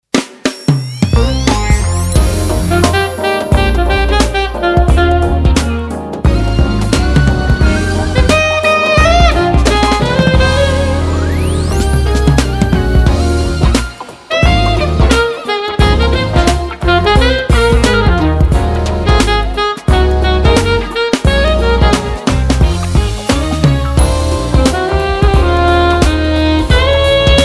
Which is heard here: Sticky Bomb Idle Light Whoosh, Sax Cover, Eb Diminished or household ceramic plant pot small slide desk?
Sax Cover